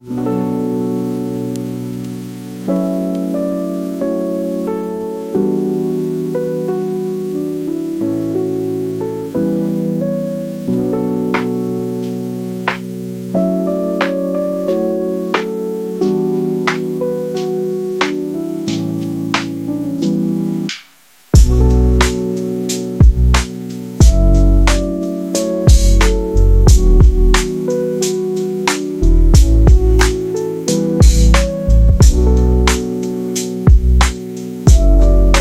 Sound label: Peaceful Beats, lofi chill hop